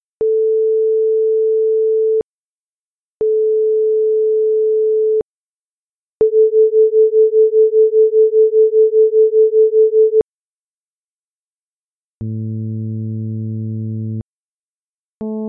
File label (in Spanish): octavas